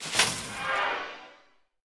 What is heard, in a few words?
Casket Exit; Deathrest Casket; rimworld